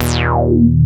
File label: ARP 2600 B2, synthesizer; Hollywood Edge - Giorgio Moroder Rare Synthesizer Collection